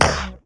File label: perc